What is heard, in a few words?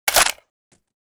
ak47 clip out; weapons